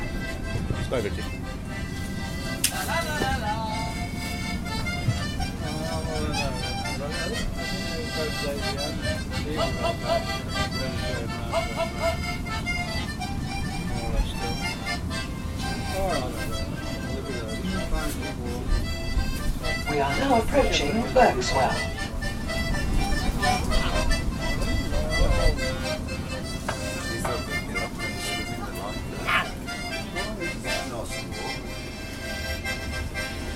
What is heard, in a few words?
accordion player on the train